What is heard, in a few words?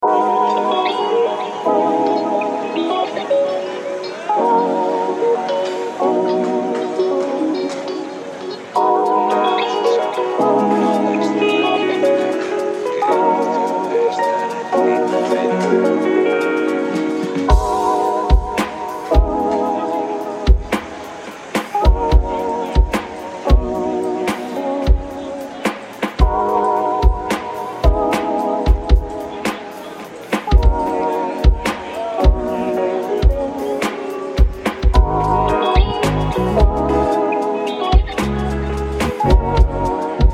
Lofi